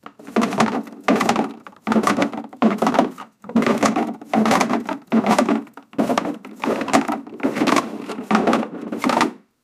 sound of a wooden drawer; anxiety

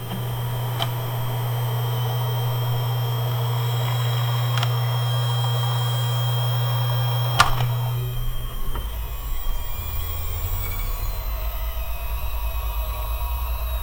sound of computer in mid, computers

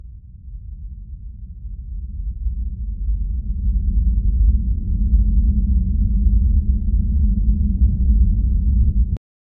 low rumble like a distant, volcano

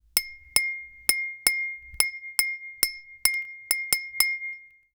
crystal bowl; musical instrument

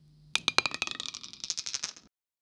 dice rolling sound

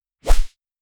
a short clean cinematic s, motion

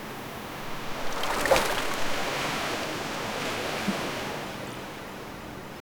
seagulls sing in the dist; beach

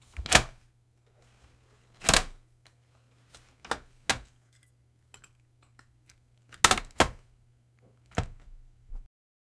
open inventory

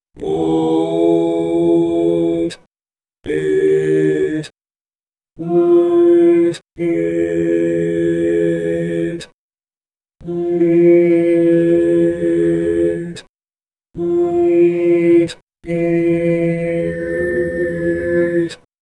a low quality unmixed and, robotic

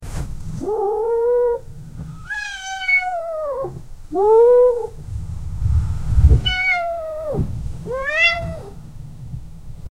cat light paw taps

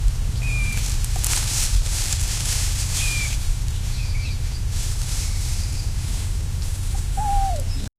soft moans of the baby, baby deer

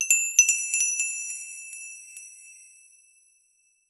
victory coin spark instan

